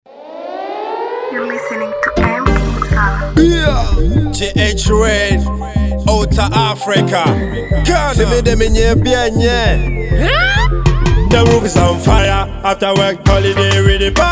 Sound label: Party Mixed